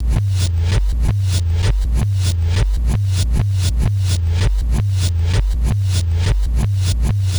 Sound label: Bas Fx Loop 130; Equipped Music; Loungin House